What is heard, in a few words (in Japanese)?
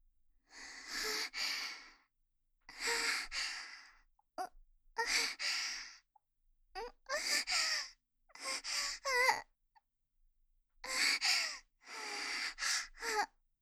喘ぎ声(小)